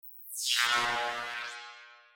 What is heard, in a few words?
Lazer